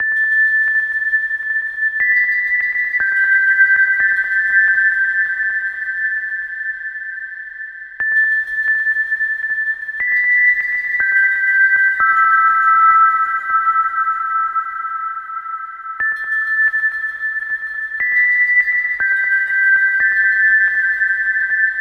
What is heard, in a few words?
Soft Bells - Am 60Bpm; Construction Kits; STEMS; Ghosthack; SkyRealm; Kit 28 - Long Walk Home